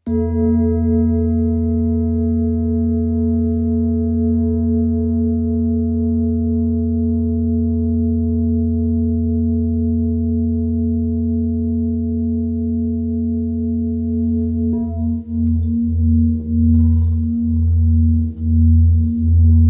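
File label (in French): Bol ramgandi do, bols chantants tibétains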